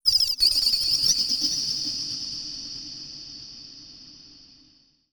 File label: TECHNO FX 140